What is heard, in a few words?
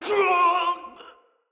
Death, Soldier Male; Battle; Barks; Dialog; Dendrit